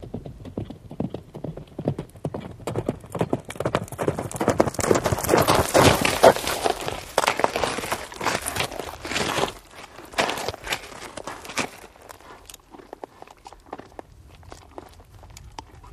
Horse Gallop In Stp Cl

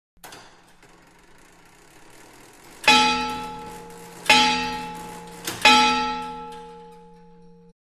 Clock 10 Tower 19th C, bell towers

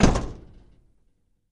Camaro Door Close Hea, chevy camaro

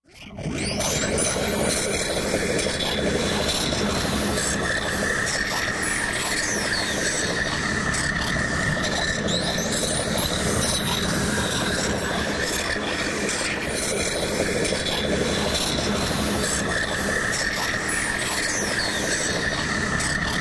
Motherboard Mulcher, digital interference

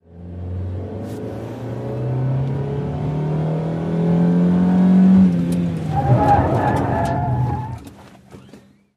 Sm Car 180 Rev Skid In, compact

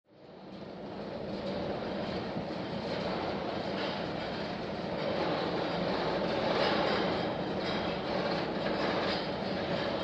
Ride Roller Coaster

